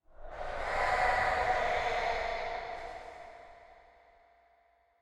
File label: Eerie Distant; silent hill homecoming 2008 gamerip pc ps3 x360